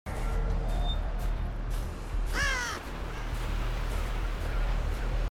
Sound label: raven; Dead by Daylight